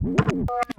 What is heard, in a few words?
tape fx, scratch; Ambient Grooves